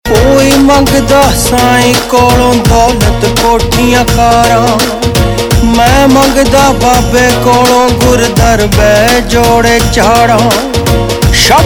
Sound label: Ringtone, Punjabi